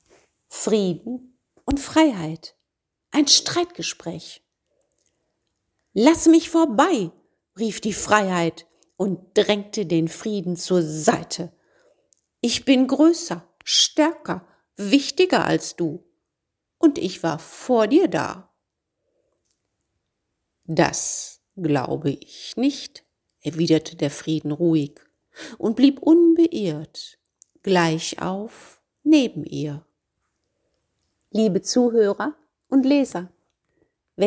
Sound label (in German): frieden und freiheit vox